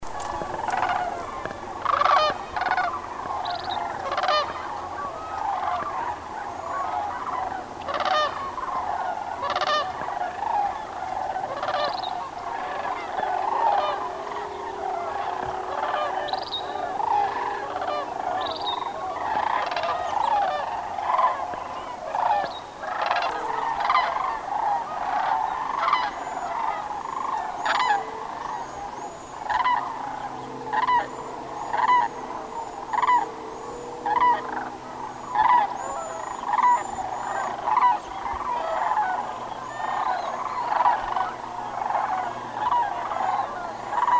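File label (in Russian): kanadskiy zhuravl golos, sandhill crane voice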